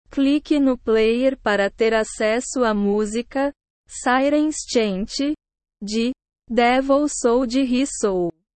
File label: sirens chant